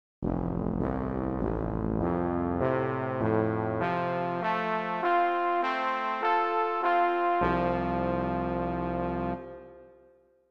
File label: tuba